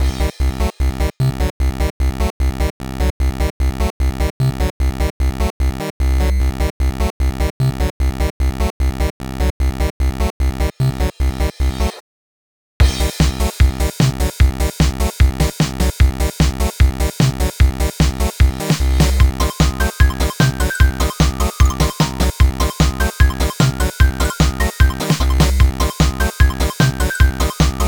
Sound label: Instrumental; Nyehehe; Friday Night Funkin Larnnys Honest FNF Songs series 2023